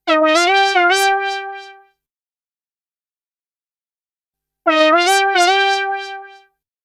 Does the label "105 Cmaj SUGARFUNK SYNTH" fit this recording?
Yes